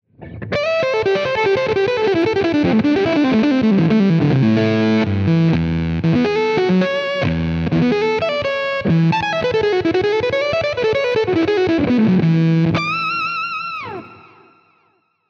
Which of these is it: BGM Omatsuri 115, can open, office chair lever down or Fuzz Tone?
Fuzz Tone